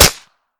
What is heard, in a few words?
pp2000 silencer, weapon